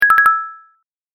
正解

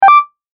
決定

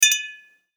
グラス乾杯

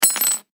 薬莢

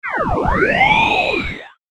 魔法詠唱

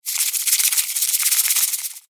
抹茶を点てる

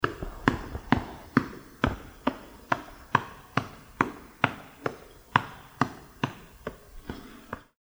布団叩き

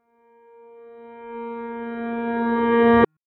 ピアノ逆再生 単発

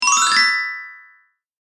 グロッケン グリッサンド

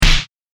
打撃

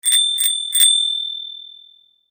自転車 ベル

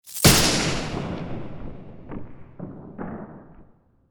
雷

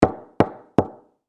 ドアノック

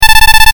文字送り ループ用

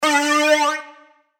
グラビティチューブ

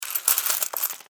お金 ジャラジャラ

